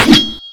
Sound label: Moonface Finish Attack